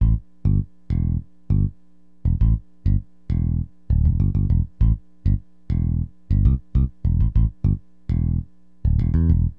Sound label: bass G; AcidJazz Funk